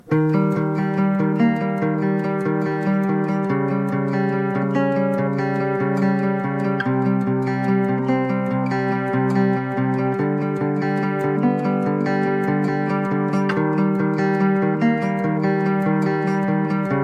acoustic guitar

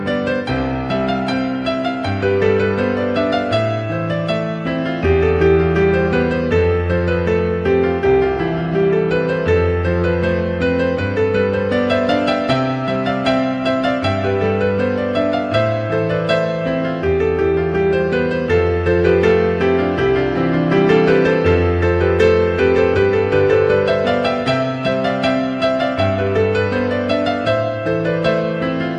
Canon in D piano version

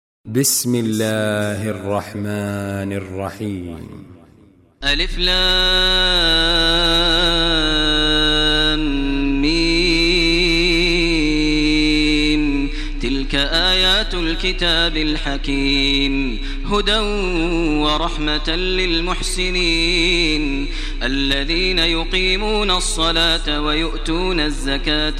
quran islamic

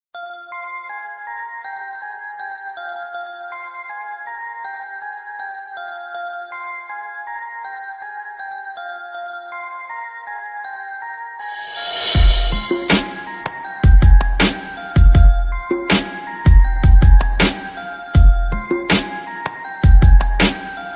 black beat

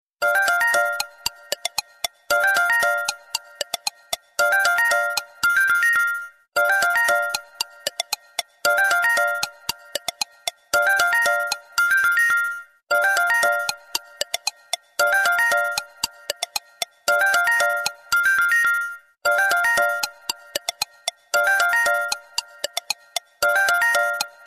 alarm clock ring

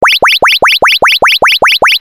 warning tone

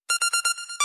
sms notify

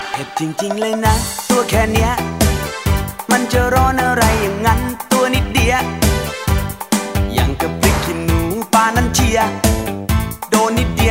funny thai song

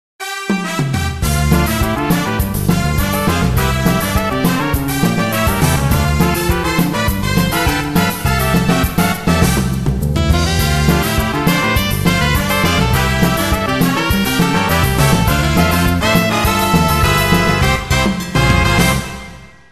latino samba

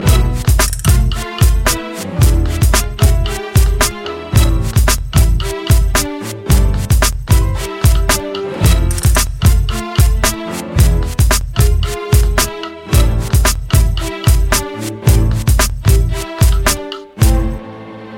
sad tango intro

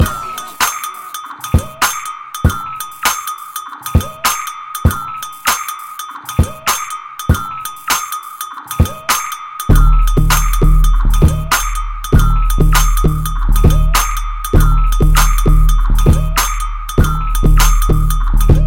hip hop beat, ringtone

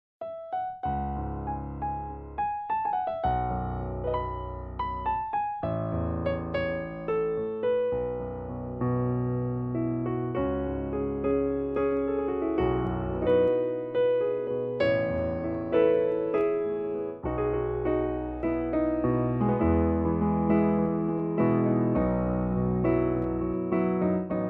slow piano; ringtone

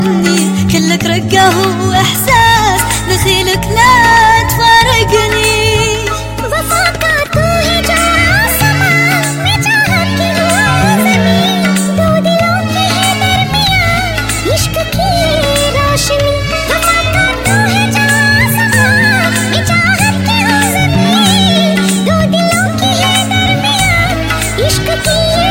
arabic and hindi

